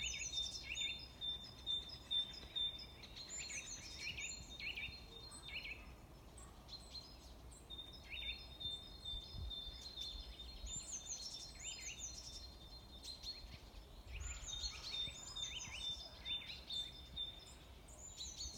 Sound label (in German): audio vögel au; lernenuntersternen